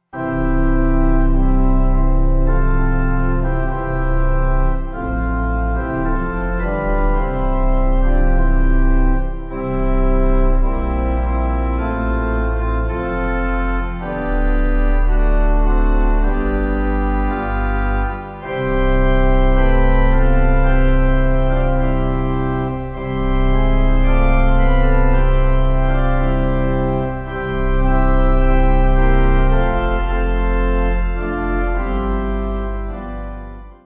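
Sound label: pipe organ; Consolator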